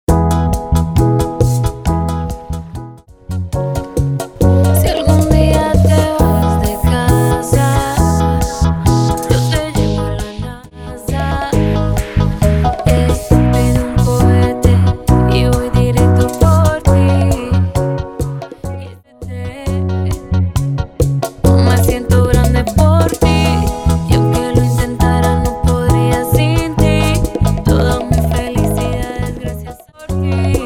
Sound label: Bachata 135 bpm; Bachata Project; bootleg bachata remix; Latin genres